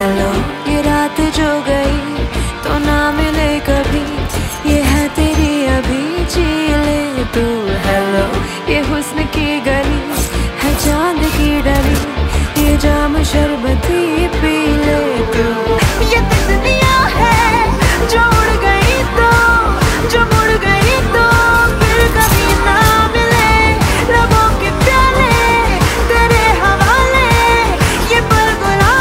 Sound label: hindi ringtone, bollywood ringtones